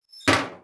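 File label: elevator door close; Mafie 1 cz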